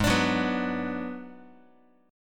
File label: Ab Minor 7th Flat 5th Ab, down, Guitar, 4A, 4E, 5A, 5D, 5G sharp, 6C sharp; strummed; JGuitar